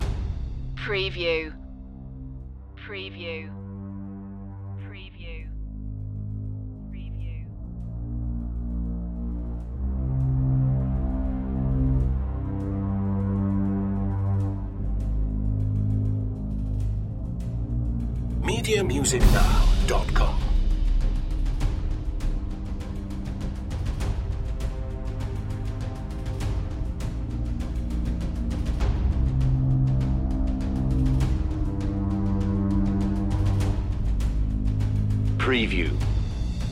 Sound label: ion rise loop